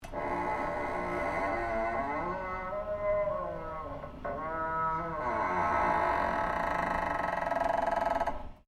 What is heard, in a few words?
Door Heavy Fire Escape Creak Stereo, Heavy weight door to fire escape; Miscellaneous Doors